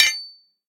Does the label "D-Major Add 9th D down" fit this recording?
No